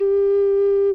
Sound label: Ocarina Note